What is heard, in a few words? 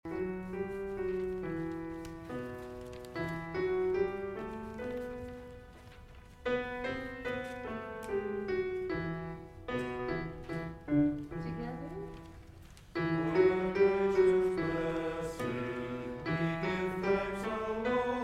Remember You Are Dust bass and tenor; Gather Hymnal